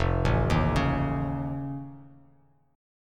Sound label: F Augmented 7th F, Bass Standard; arpeggiated; F+7